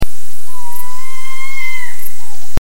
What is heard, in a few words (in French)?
chouette hulotte